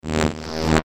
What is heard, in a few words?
future buzzing elements layer